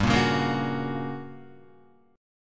G Minor Major 7th Flat 5th G, Guitar, 4F, 4A, 5C, 5G, 5C, 6E; GmM7b5; strummed; 2,1,1,3,1,2